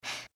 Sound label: breath; pony; Twilight Sparkle